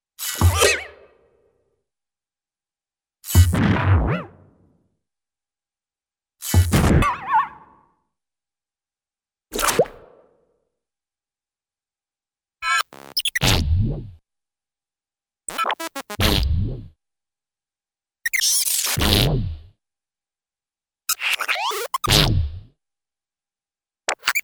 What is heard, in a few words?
Magic Wand - Musical Sizzle Pops chirps rasberries Squea; Cartoon; Hollywood Edge; Cartoon Trax